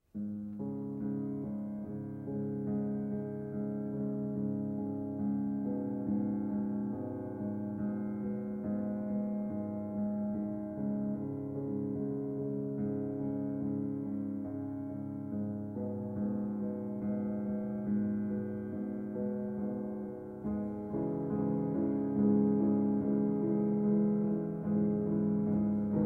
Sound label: Nocturne For Piano